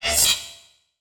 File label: Digital; Adobe; Multimedia Internet CD-Rom Flash